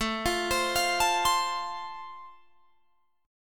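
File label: A Minor Sharp 5th, Guitar Open G, chord strum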